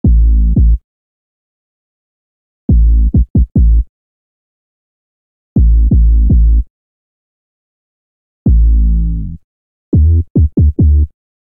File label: A Minor 83 bpm, Bassline; MIDI Clips; Tonal; New Old School; Ableton; Factory Packs; Chop and Swing